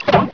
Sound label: light off; amphetamine